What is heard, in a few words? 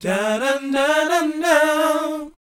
BOYZ, Vocal Planet, R&B & Dance; ILIO